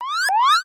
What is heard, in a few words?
siren